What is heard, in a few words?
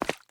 Step Walk Puddle; SandBox Musics